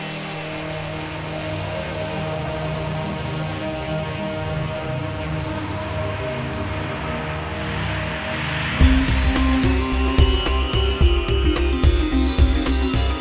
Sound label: Fill